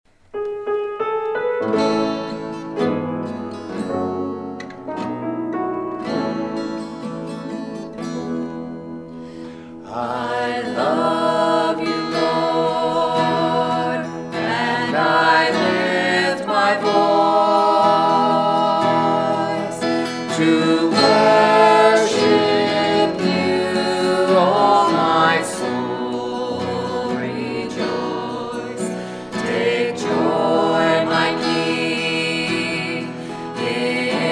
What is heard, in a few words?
Closing Hymn